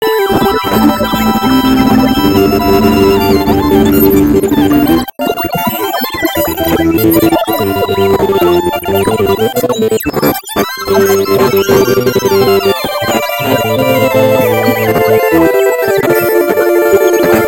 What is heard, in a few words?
Hippie organ